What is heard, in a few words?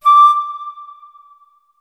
flute notes; Hideout Furniture